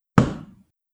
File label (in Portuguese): batida; processamento de audio